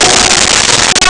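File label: Nylon String Guitar Gb5; Nintendo; July 2020 Gigaleak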